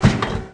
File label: Mech Sidestep; Tallan Mech; Aliens